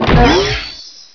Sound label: JUMP; win95